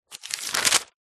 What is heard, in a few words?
household magazine cheap page turn